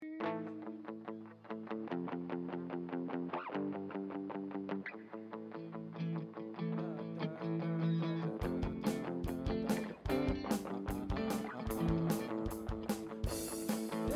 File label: Strings Mix